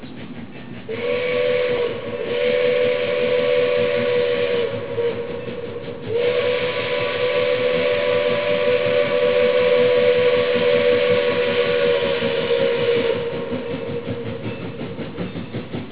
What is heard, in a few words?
train